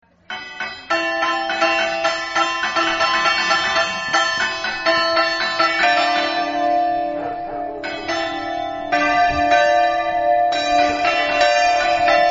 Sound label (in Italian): melarolo, campane